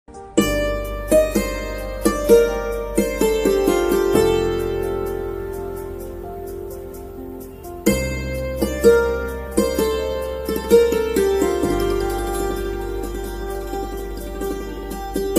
ringtone instrumental sad instrumental music song ringtone instrumental